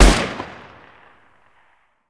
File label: 45 smg, weapons